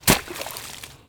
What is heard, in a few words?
Splat